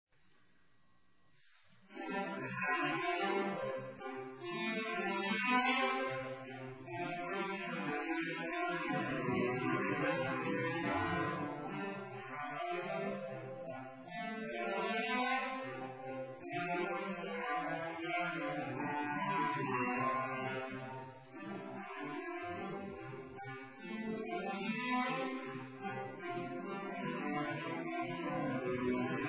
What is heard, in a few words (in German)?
Menuett in G-Dur; Minuet in G major No. 2; Kinderlieder